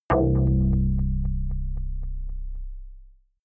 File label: synth hit drop echo deep